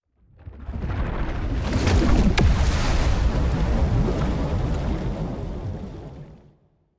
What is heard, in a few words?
colossus megastructure hit; aquatics